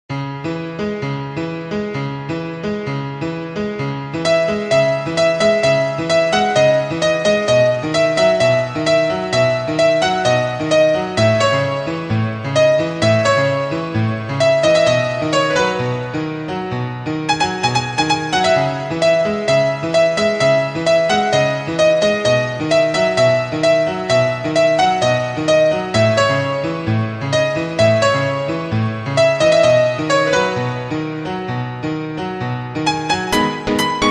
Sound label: piano tutorial